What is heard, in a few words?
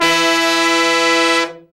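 brass section; BRA SECTIONS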